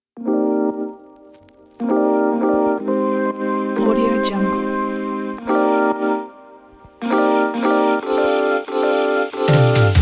upbeat hiphop

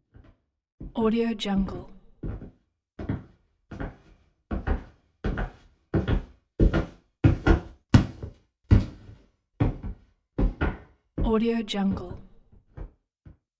footsteps on wood

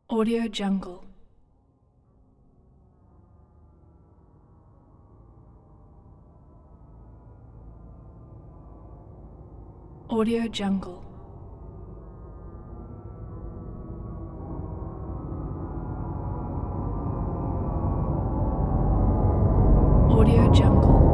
mothership pass by; hollywoodedge

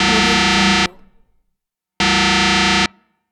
strong scifi alarm loop

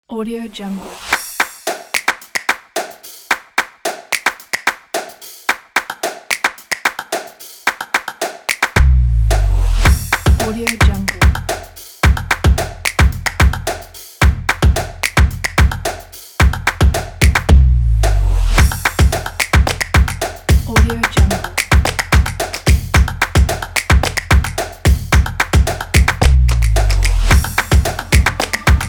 drum

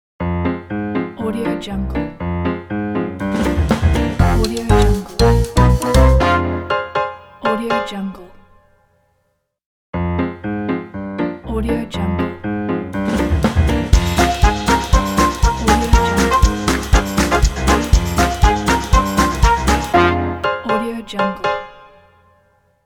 upbeat jazz logo

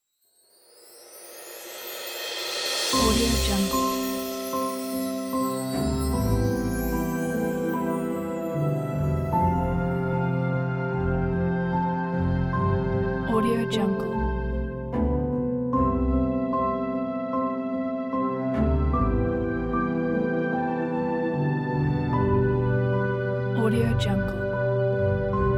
emotional intro

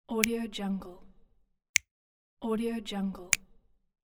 snapping fingers

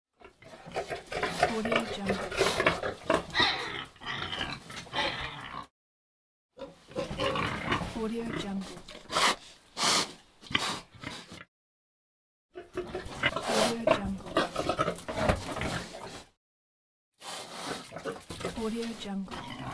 pigs in stable

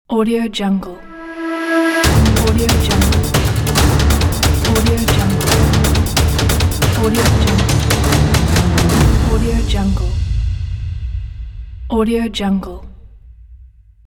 motivational cinematic drums logo

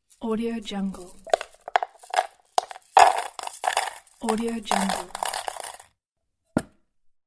box with pennies

